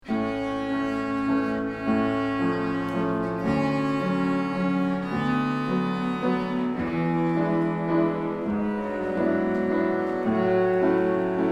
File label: In waltz time, cello